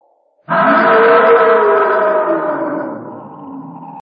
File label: Yeti growl